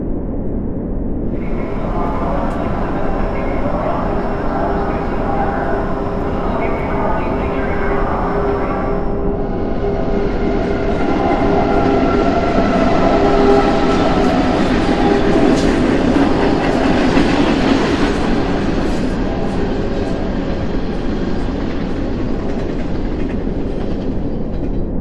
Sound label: Ambience Exterior Trainstation